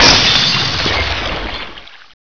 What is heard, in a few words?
tank glass breaks